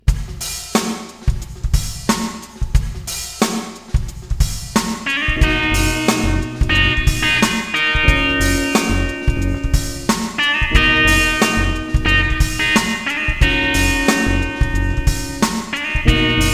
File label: jazz hip